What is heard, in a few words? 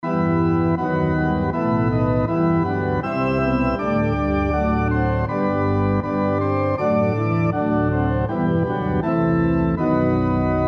SATB on Piano Example